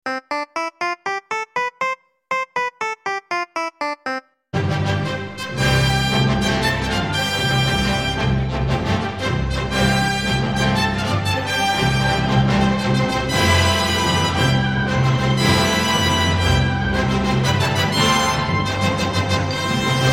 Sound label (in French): ionien